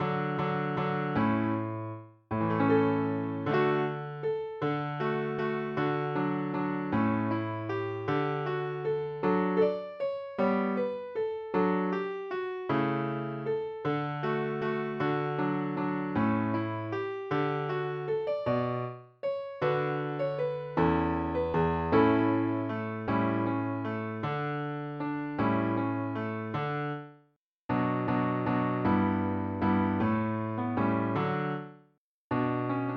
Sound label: air from magic flute, guitar